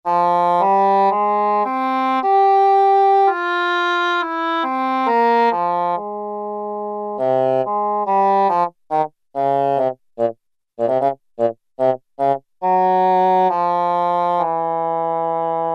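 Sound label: Woodwind English Horn 1; Brass And Woodwinds; Roland L-CDX-03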